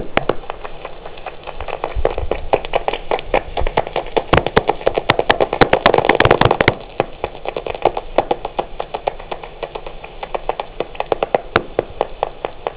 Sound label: barbastellus; sismisi